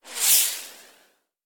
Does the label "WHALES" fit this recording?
No